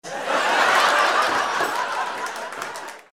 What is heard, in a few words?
laugh applause table